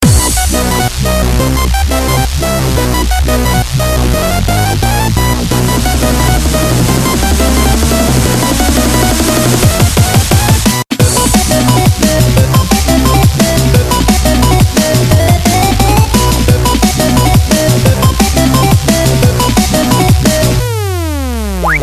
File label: Pacman Techno